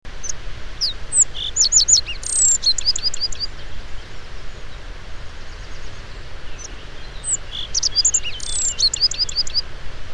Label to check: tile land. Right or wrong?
Wrong